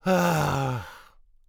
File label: sigh; BATTD; jake